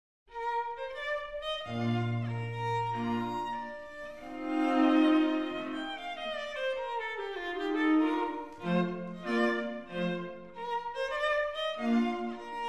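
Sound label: quartet; viotti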